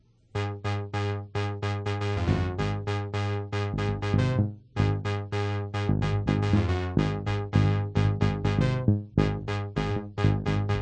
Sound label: Hit the Mic Bass